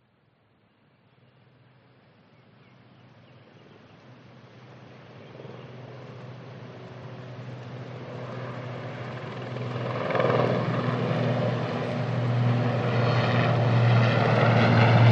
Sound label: vehicles helicopter military eurocopter AS332 super puma fly overhead